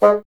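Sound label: wind bassoon G; Miroslav Vitous - Solo Instruments VOL-1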